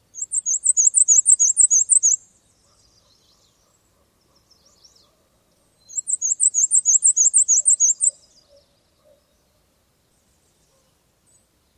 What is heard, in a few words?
songs